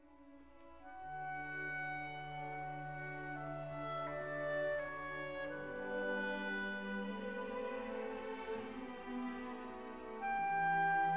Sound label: Clarinet Con A